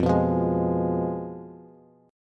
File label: F Diminished F down Bass; Fdim strummed; JGuitar